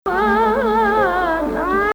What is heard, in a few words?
Hijaz